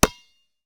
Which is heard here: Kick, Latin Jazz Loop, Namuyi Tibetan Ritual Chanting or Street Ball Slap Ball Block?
Street Ball Slap Ball Block